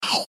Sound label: bunny eat; inlogic-bunnyquest